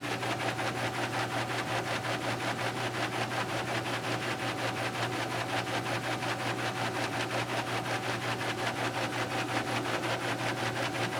Pool Pump Motor Runs, Churning Constant; Fairlight Sound Lib

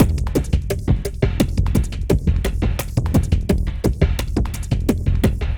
Percussion Loop 172 Car Chase; Drums & Percs; Percussion Loops; Ghosthack

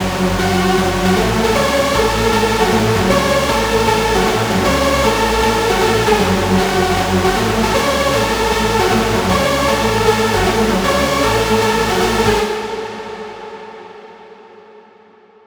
lead loop F#min 155; Music Loops; GhostHack

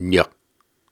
Syllables and Imaginary Words, Viking Vocal, Hard

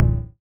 Tom, Synthesized; Drums; One Shots; Ghosthack; Drum Mega Bundle; Drum Hero 5; Alkaline

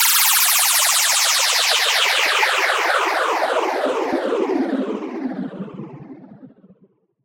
Riddim Downlifter; One Shots; SimmerDown; Ghosthack; Riddim Essentials 2020

